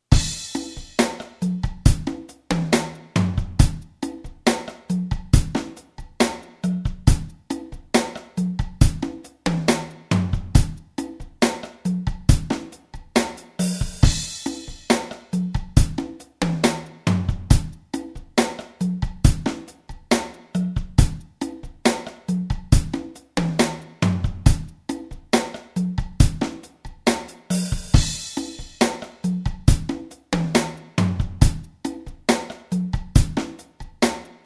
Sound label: Acoustic Slow